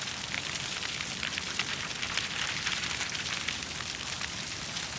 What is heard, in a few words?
Chandelier; Doodad